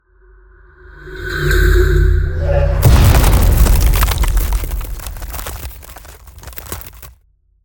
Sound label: Ice Spell Damage AoE Frost Nova; Arctic Aura